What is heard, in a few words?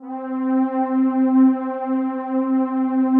PAD, DIGI-PAD